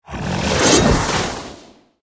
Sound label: hounds attack